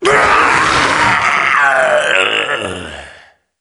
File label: death; doom3; sabaoth